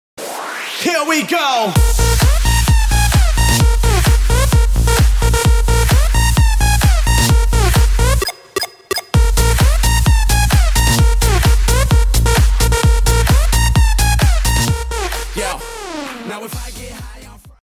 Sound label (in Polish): klubowe zm